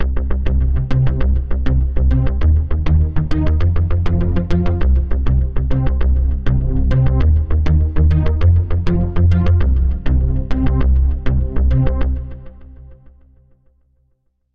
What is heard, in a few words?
Bass; KORG OASYS; Karma Bass; oasys assault